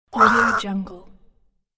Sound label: furious bird groan